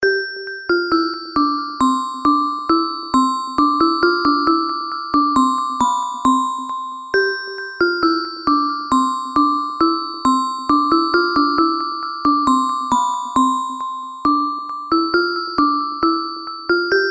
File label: Deck The Halls